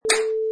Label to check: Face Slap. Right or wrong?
Right